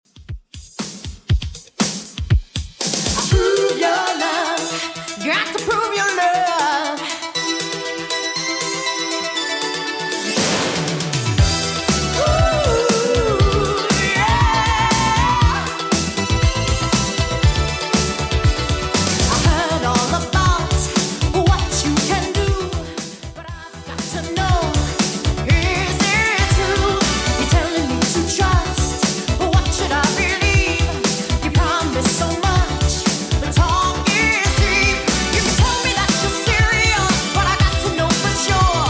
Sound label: 80s Redrum 119bpm